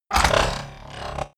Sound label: sci-fi electromechanics expand evil